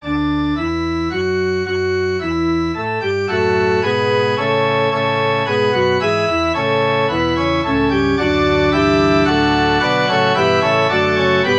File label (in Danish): forspil; din sde forening